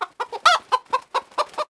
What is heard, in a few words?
Chicken; lego my style kindergarden